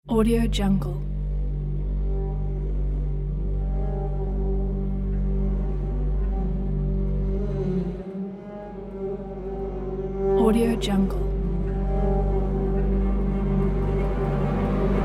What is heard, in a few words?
dense orchestra